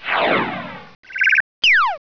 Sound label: atk shot